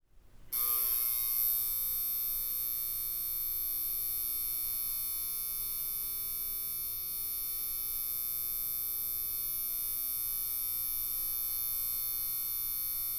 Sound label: Light Bulb Buzz, Flickering; Haunted Bloodlines; SFX Producer; Lights